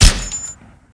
MINIGUN; blockade classic

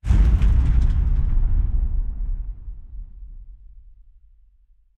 Giant Step

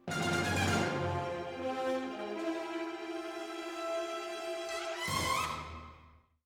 Sting; lego the incredibles 2018 switch; Fakeomni

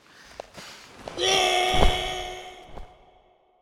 Well; yesterday origins gamerip 2016 switch ps4 pc ios macos

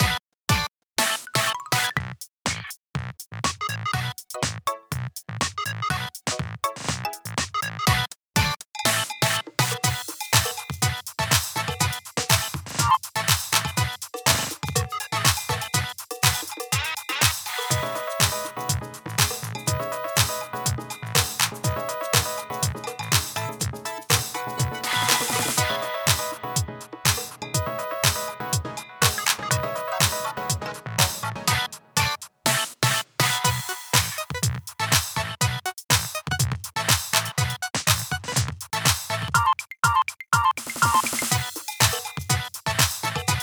Common BGM Shuffle

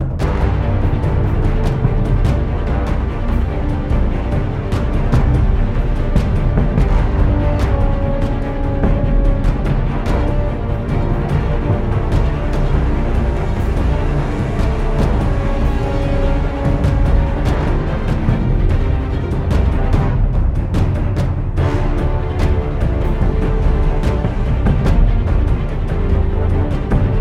Loop Fight

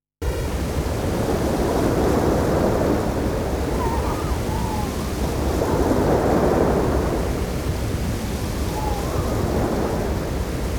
Stage Ambient Music; snk arcade sound digital collection vol. 18; Kaede

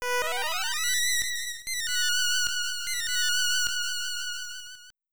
New Path Found Jingle; Hebereke; NES

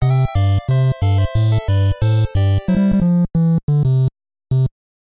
Tennis Break Point; animal crossing full gamerip

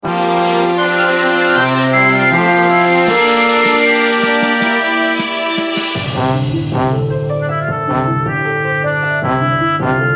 Bgm Fantasy

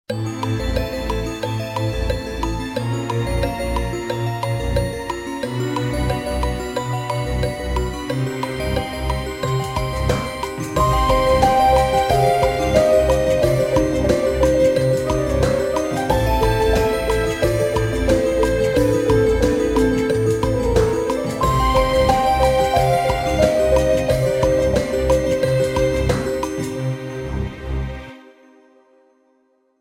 Christmas Music 30 Seconds Countdown; Kahoot

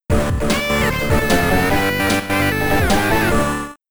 Heavy Metal Jingle; amiga; Ultimate Pinball Quest

